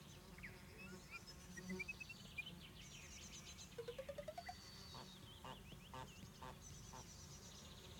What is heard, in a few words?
ambience general; flowers